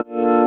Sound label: Bb STAB; Loopmasters; Funky Underground Vol 2